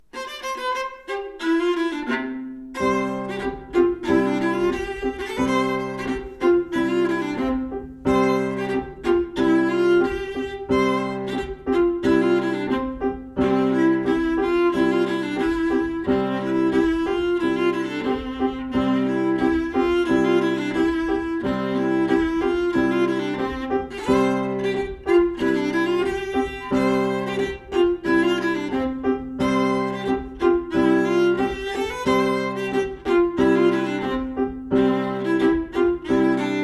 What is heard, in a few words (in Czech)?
pasli ovce valasi doprovod klavir; koledy